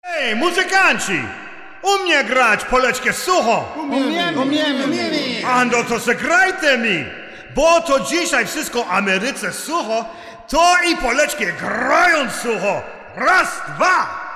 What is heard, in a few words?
Polka